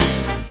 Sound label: Horn Hit; Sonic Foundry (Sony Creative Software); Knutrix Drum & Bass